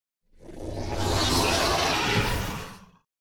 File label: Monster Big Alien - Angry Growl; Hollywood Edge; sound designer's toolkit v2